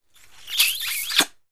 Kisses: Sloppy And Funny, From Close Perspective.

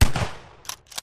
SHOTGUN PUMP

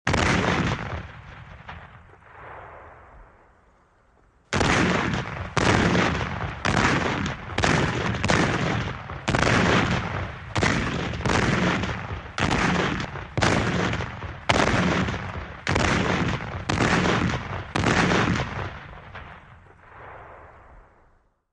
Howitzer Cannon Shot, civil war cannon exterior

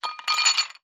Ice, A Few Ice Cubes Into Glass.

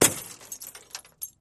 Glass Smash Auto Hea

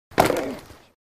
Football Blk Grunt, football

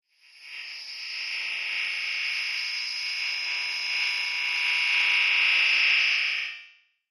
Noise Elect Line Buzz

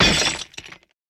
Dish Falls Breaks

